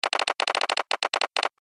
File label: Telegraph Sounder, beep; Telegram; Beep Sound Effects